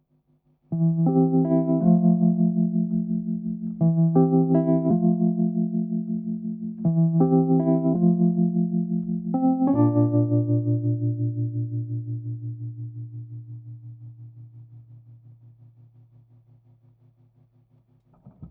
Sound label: keyb